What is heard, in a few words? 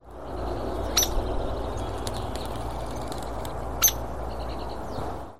Hairy Woodpecker call